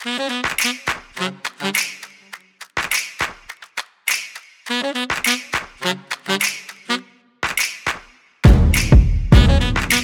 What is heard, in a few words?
185 Heartbeat